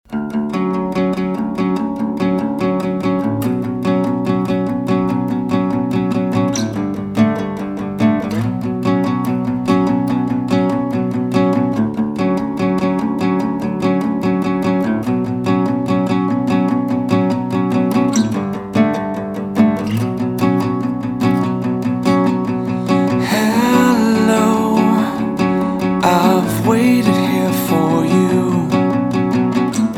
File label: ACOUSTIC RINGTONE